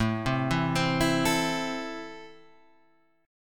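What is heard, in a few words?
A Minor, chord strum; Am Chord {5 3 2 5 5 5} arpeggiated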